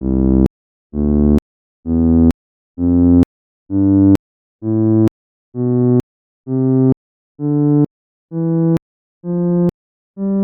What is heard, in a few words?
bassoon